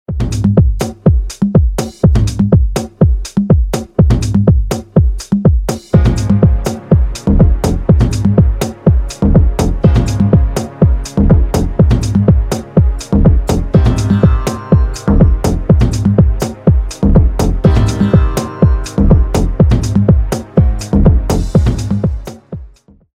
into dark iphone ringtone

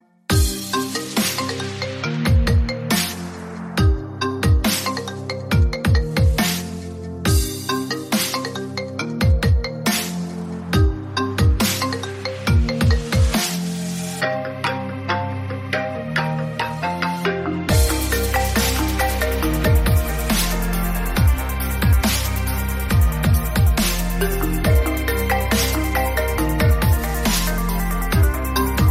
mobile musical remix ringtone

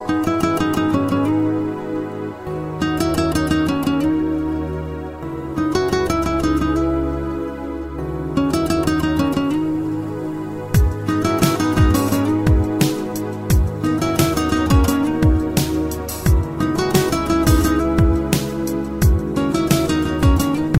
very romantic guitar english love ringtone

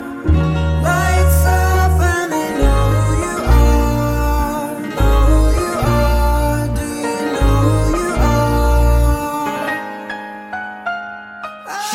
english music

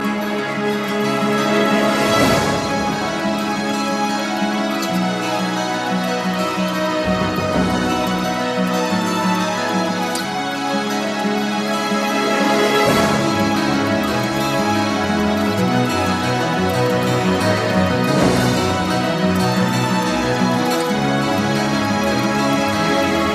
mountain guitar, ringtone

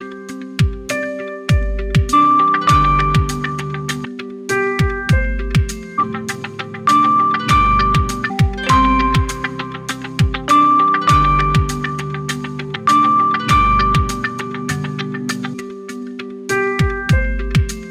remix ringtone; samsung

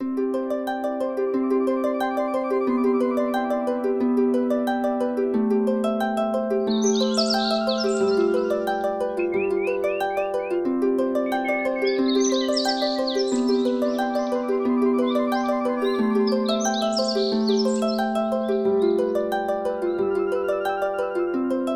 wake up ringtone

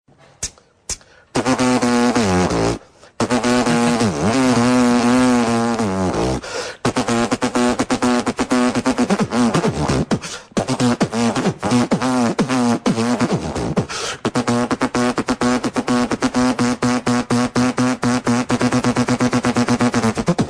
beat box ringtone, funny

